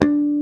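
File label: HARMONIC, Classic Guitars; E-MU Formula 4000 Series Vol. 3; Analog Odyssey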